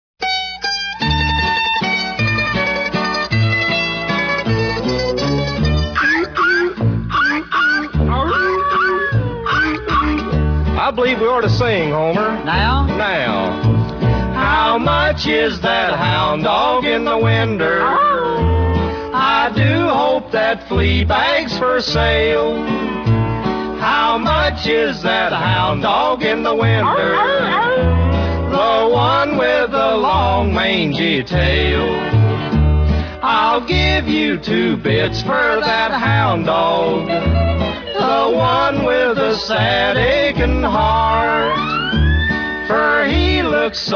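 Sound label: Brass Playing Mishaps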